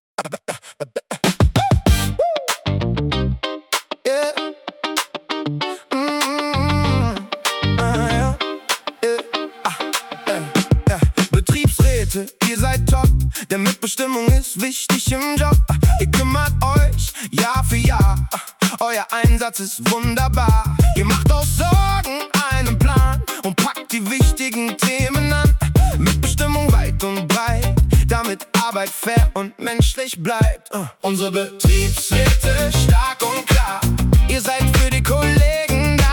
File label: hip hop